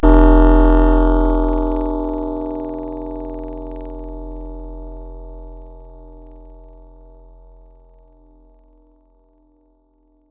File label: Synth Bell; Synthetic's Serum Collection; Surge Sounds - ASTRO - Serum Wavetables